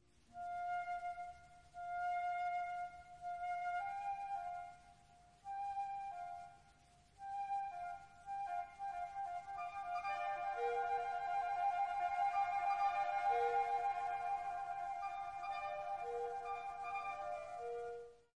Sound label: Bird Chorus; Beethoven Symphony No. 6